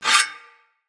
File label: Melee Impact Metal